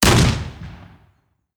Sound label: small Win; netent